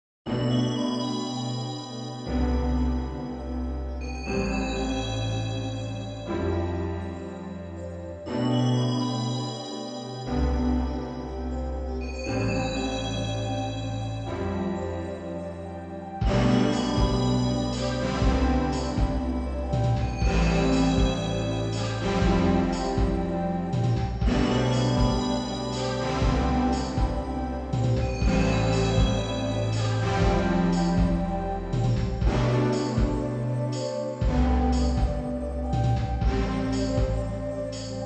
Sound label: Hardwaresynths wet; Savant Audio QRS